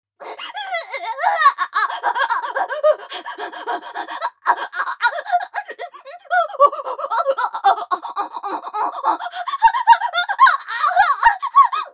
激しい息遣い; A-15 ヘレナにアナルファック射精前の激しい息遣い; アスティリア

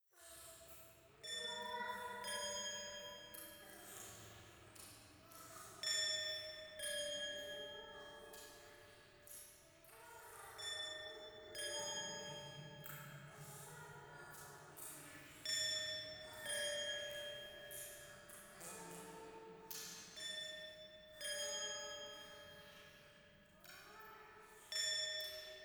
廃墟 イラマチオ 寺

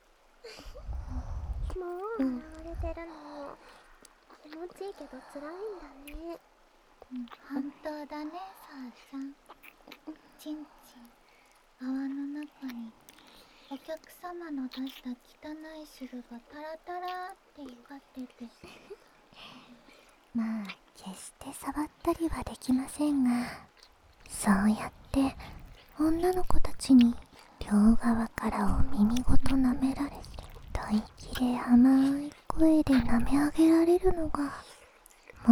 耳舐め安眠用; ムラムラ夢精

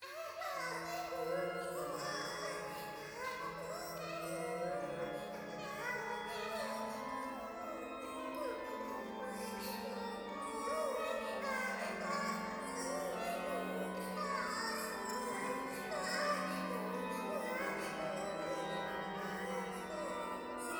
可愛い喘ぎ 讃美歌

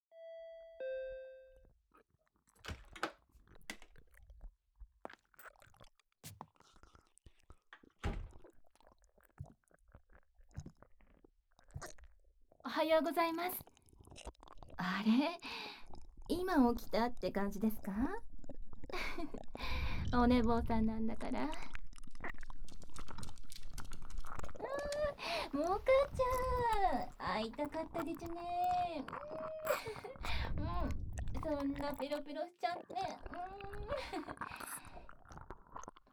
耳舐め, ASMR; 22分間耳舐めされながらベテランペットシッターにベロチュー・愛撫・対面座位・乳首舐め・アナル舐め手コキ・正常位される音声

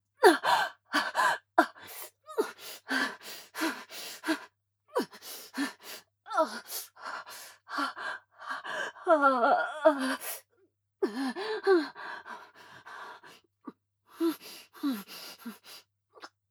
挿入中・息遣い・加速; アスティリアに挿入中; ヘレナ

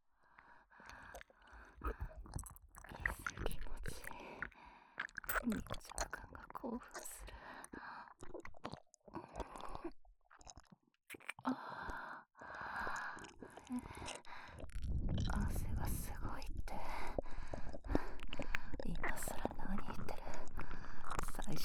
耳舐め ディープキス 手マン バック 騎乗位 お掃除フェラ 音声; ダウナー獣人奴隷に; 子作りして

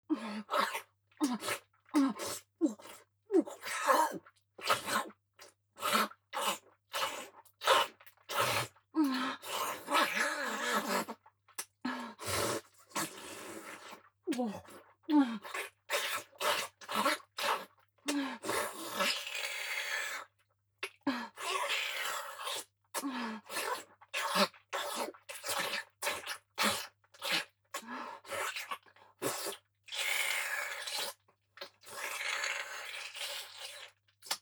フェラ・激しめ; ヘレナ

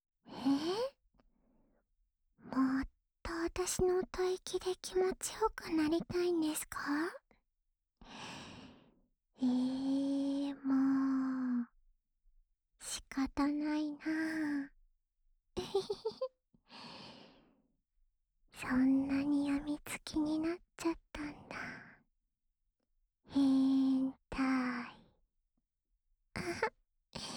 安眠用お耳ふーふー（右耳）, ASMR

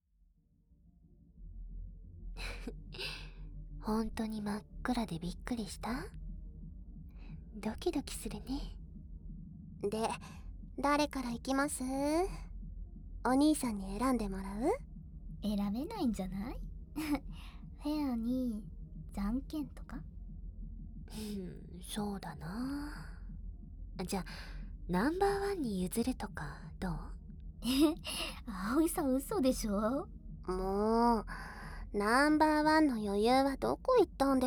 舐め舐め, ASMR; ハッスルタイム♪3人とハメハメ舐め舐め特別コース

